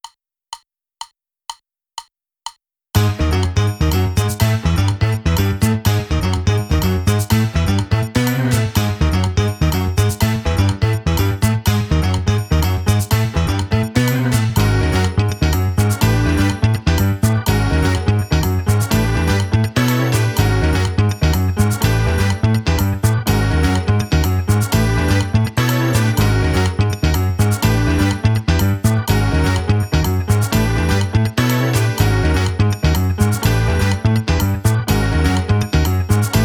The Heat drumless

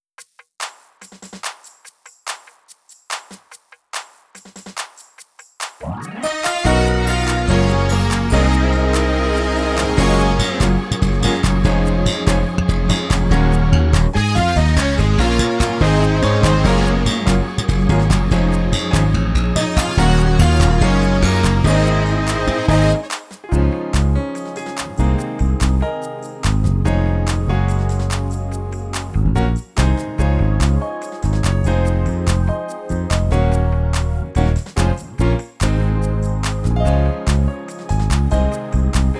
drumless

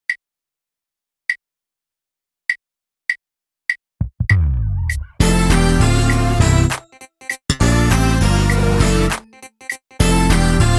Groove drumless

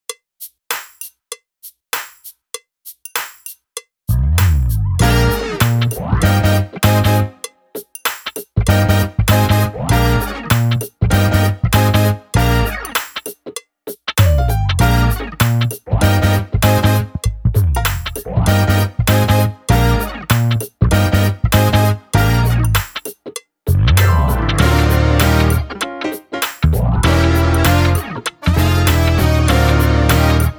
Gospel Funk drumless

